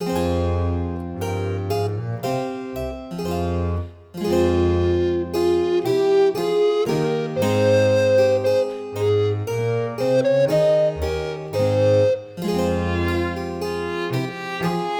Pavane und Galliarde

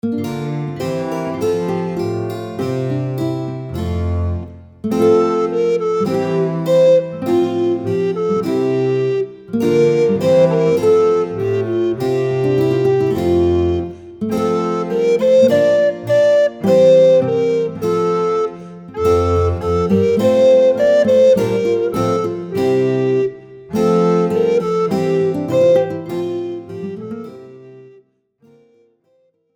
Gavotta, Floetentoene; Jede Menge Floetentoene 1

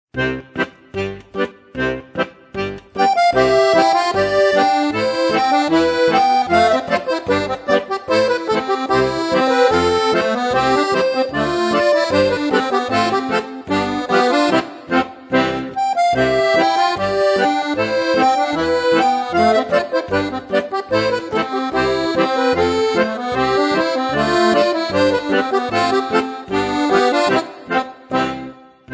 Irland, Accordion; Miss Murphy

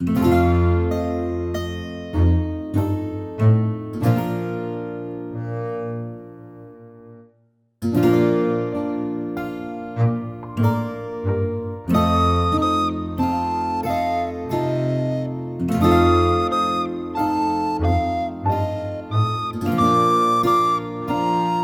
Jodler

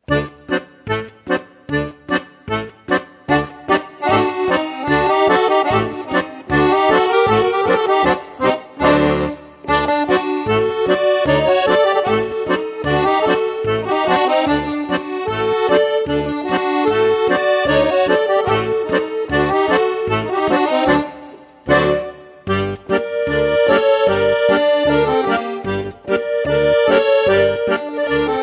Klezmer, Accordion; Das Zitrönchen

